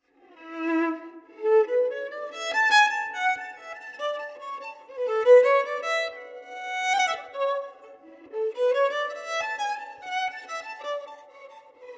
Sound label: Duet